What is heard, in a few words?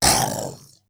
zombie rage; zombies